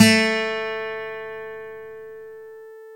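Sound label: GTR A3 AC F; Synclavier Sampler Library; KEYS AND GUITARS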